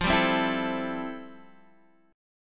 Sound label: A-Minor 7th F; Am7/F strummed; JGuitar